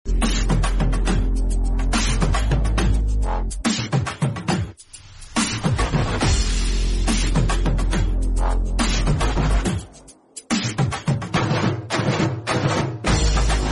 villain bgm tamil; kodi

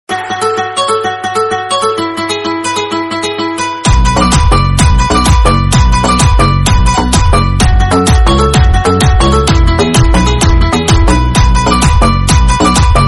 nature bgm

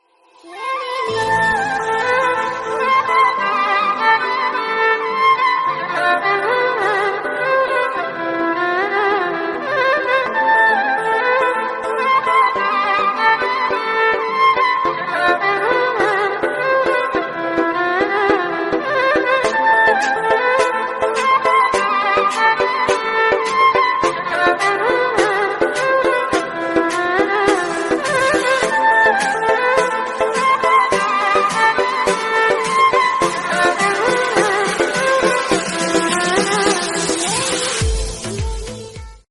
arabic ringtone

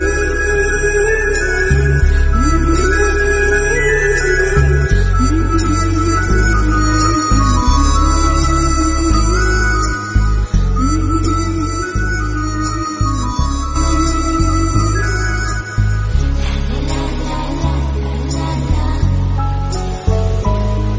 movie bgm; jeans

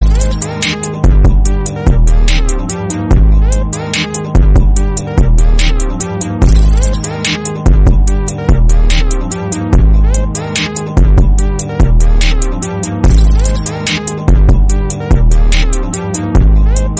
villain beat bgm theme

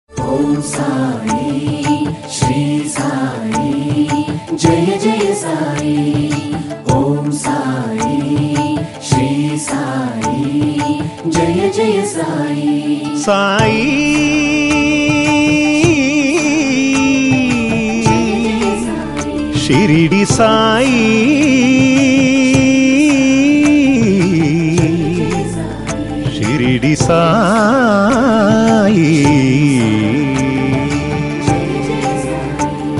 devotional

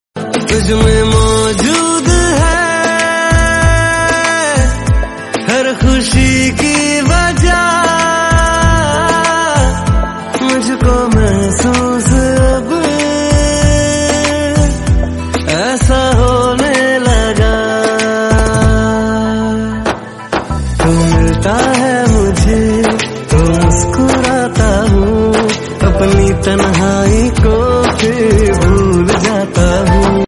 hindi romantic song

